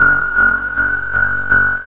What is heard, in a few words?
SYN FX